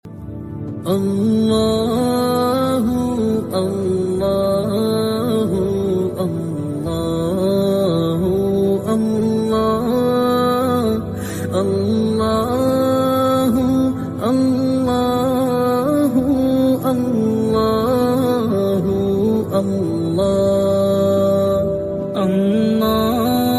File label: allah hu ringtone, islamic